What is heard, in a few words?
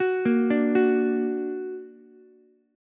D Augmented, D, down, Ukulele; D+ arpeggiated; JGuitar